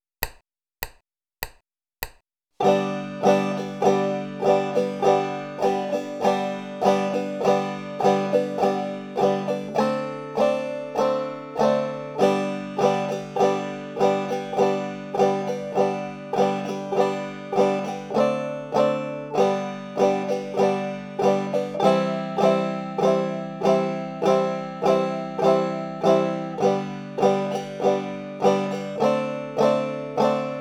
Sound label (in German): einfache akkordbegleitung